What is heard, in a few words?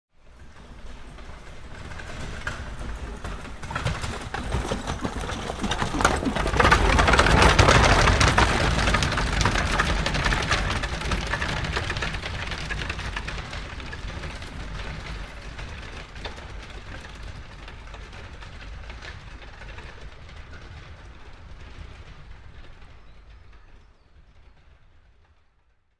Horse Drawn Carriage By Fast; Horses Carriages and Traps; Hollywood Edge Historical Series